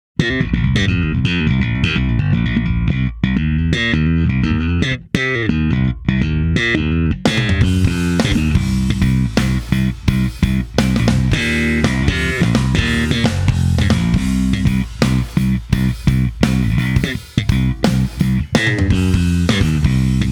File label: 170bpm